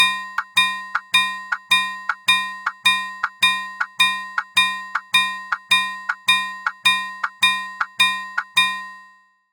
EMD steel locomotive bell

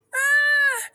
scream

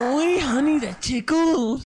low tickles

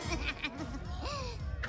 laugh gremlin; niffty

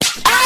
slap; quandale dingle

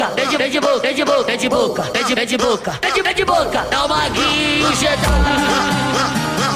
phonk; tiktok

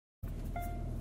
hyundai reverse car

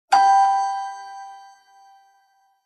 correct answer

